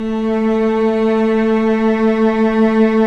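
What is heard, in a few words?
cellos, vibrato, mezzo forte; String Master Series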